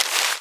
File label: paper land; Golden Crayon Sounds